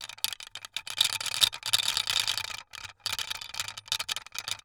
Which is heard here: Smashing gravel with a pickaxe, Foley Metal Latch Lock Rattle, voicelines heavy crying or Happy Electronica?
Foley Metal Latch Lock Rattle